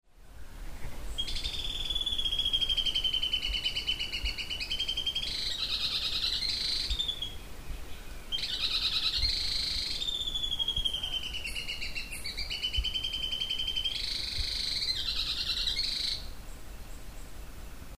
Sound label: Sclerurus scansor Vira folha